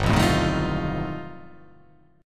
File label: G Augmented 9th